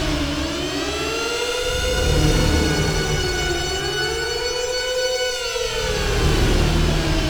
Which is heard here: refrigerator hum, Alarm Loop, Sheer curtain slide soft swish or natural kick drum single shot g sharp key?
Alarm Loop